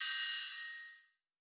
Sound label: Smooth Ride, cymbals; House; Drum One Shots; Cymatics